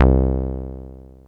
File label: SOFT C2; Best Service Alpha Dance vol.2; SOFT SEQ.1